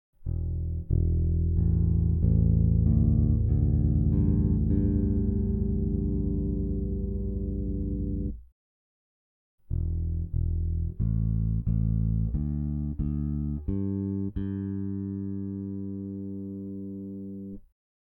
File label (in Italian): Suonare il basso elettrico tocco appoggiato